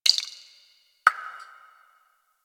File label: Ocean Interior Drips; Ambience; Warframe; Grineer Ocean